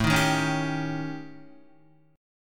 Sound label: A 7th Flat 5th, down, Guitar